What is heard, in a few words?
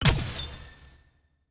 block destruction glass